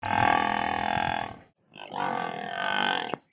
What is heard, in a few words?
African Buffalo Grunting